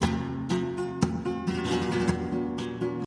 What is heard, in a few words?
Flamenco, guitars